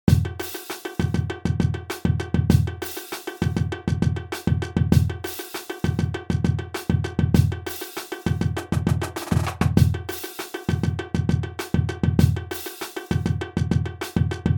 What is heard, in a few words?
stadium groove, brass